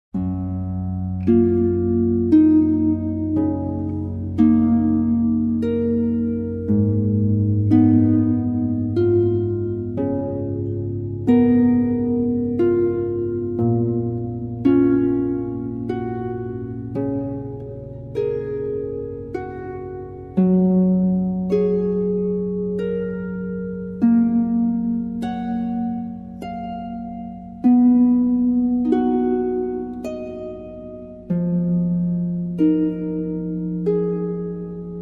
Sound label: Lullaby